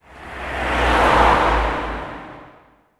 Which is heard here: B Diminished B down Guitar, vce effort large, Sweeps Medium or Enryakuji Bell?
Sweeps Medium